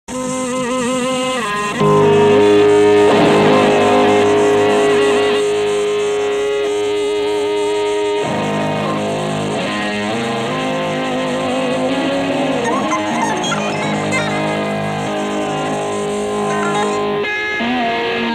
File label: backward guitar experiment